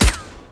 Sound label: taiko soft hit clap; Clicking Head